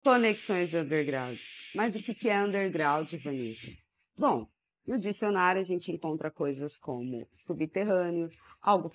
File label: vocals